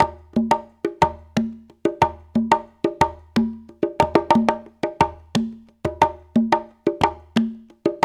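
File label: 120 bpm, 6/8; Spectrasonics; Supreme Beats; African Contemporary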